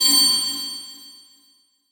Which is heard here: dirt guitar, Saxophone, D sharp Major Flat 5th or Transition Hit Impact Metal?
Transition Hit Impact Metal